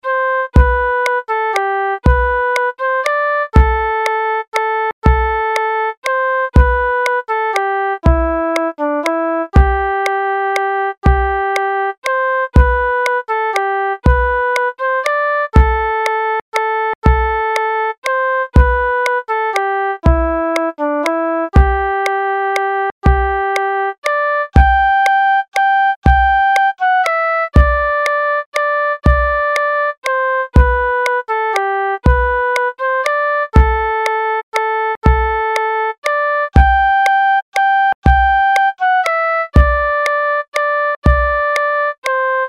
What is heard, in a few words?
South Wind Waltz with slow rhythm